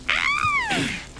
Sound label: cute spooked noises